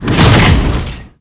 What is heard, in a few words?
Prison Door Close; blue archive